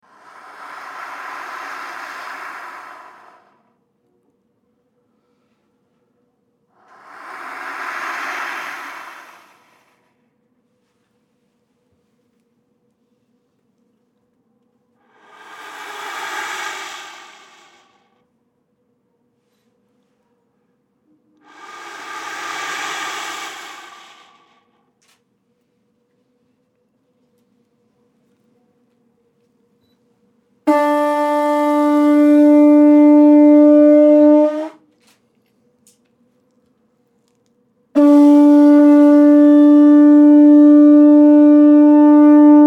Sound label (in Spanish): sonido del viento